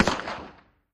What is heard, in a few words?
Russian SKS Single, sks 7x6